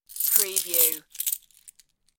Coin Rattle

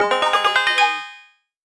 Multimedia Chime

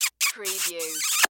Kiss Smooch; Sound Effects Bible

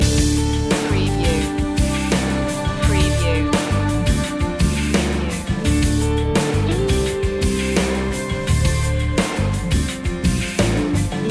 earth groove loop

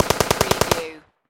Machine Gun AK-47 Long Burst Close Perspective

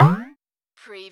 CARTOON BOING

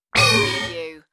Alarm Hit

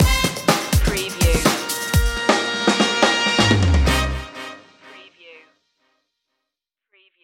retro quest stinger

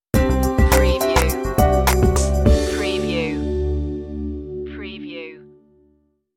downtown groove stinger

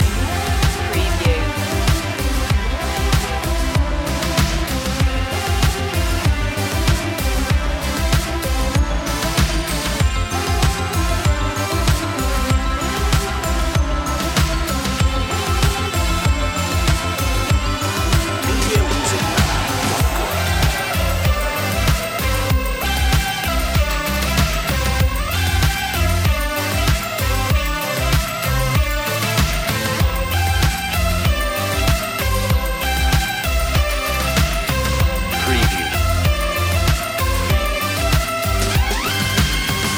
loop

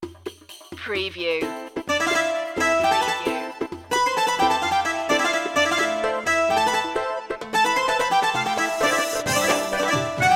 egyptian dance light

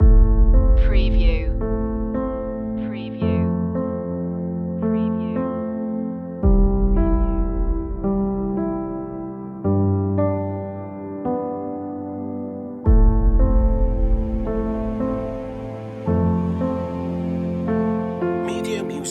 heartbeat inspiring cinematic orchestral

